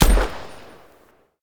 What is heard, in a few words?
chopper shoot; teardown ps5 windows xbox series xs gamerip 2022